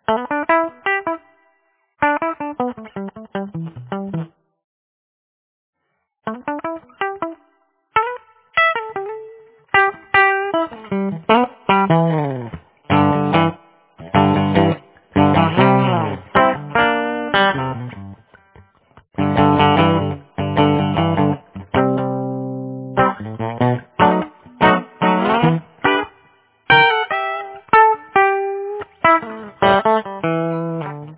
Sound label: bluesy